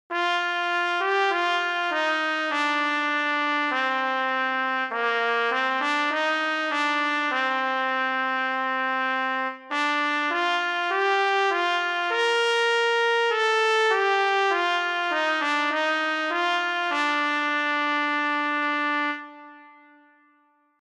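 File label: solo trumpet; Alle Jahre wieder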